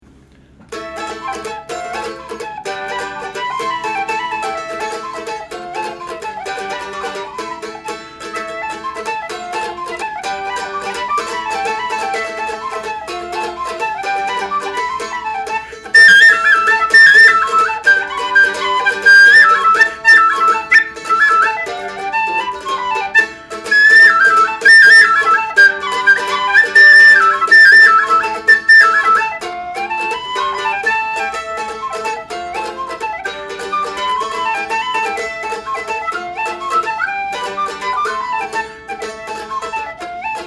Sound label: Mando Whistle; TamLin; Sound Test